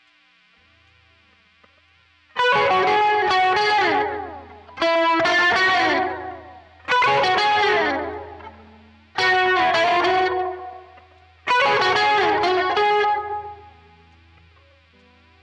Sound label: quilter solo